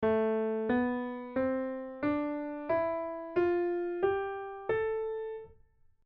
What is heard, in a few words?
Am scale